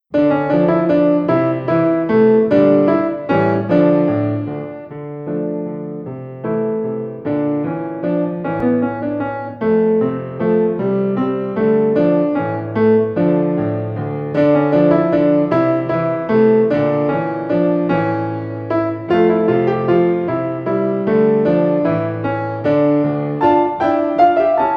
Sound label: minuet accomp